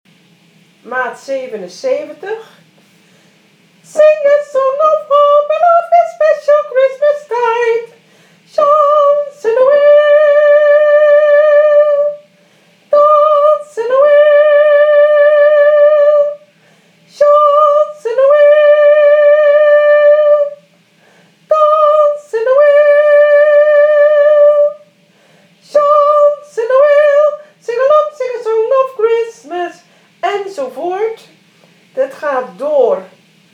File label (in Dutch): sopr 2 en alt 1